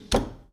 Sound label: Foosball Forward Slide